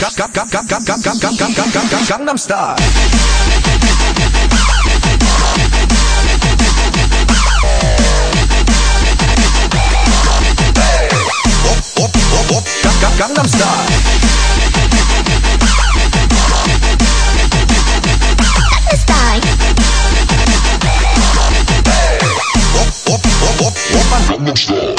dubstep

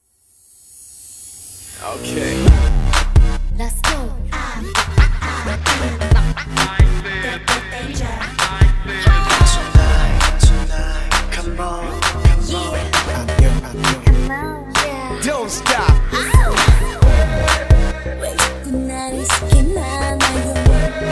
Kpop

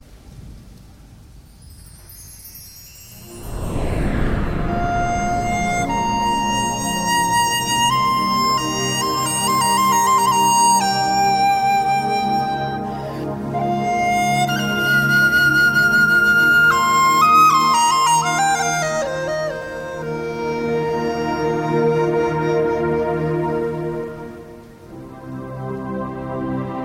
Relaxation Flute

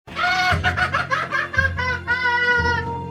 laugh; Joker 2019

